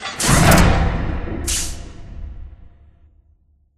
Robot Arm Track Speed Change Close; Boomtown; Titanfall 2

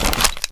battery pickup bright; Titanfall 2

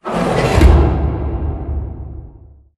pod door lg verb close slide; Titanfall 2

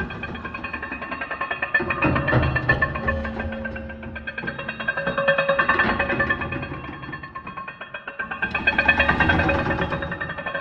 mechanical rotator gear close; Titanfall 2

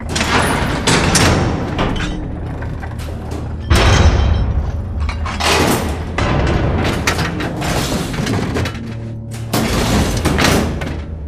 dog whistle idle mech close; boneyard; Titanfall 2

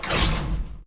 bullet generic titan shield scatter; Titanfall 2

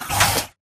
Mvmt Servo Light Short; AI Stalker; Titanfall 2

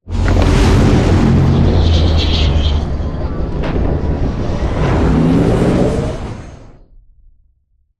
strafe close; Beacon; Titanfall 2

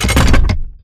Metal Jog; Titanfall 2

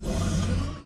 atlas leg servo; Titanfall 2

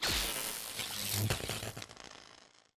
arc titan sizzle; Titanfall 2

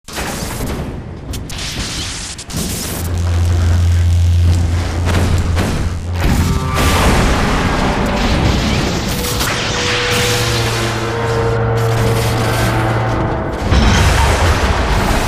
dog whistle overload; airbase; Titanfall 2

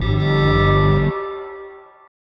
siren; Titanfall 2

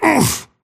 grunt hits; Titanfall 2

